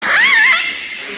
Orgues

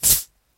Ouvrir un soda

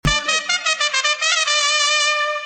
Mexicain

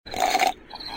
Paille

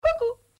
Coucou

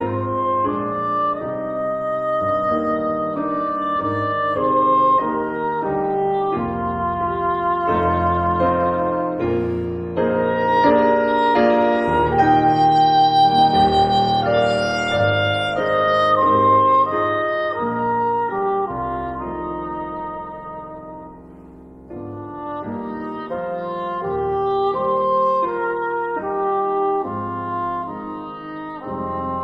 Trompette; instrumental

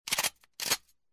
Charger Arme

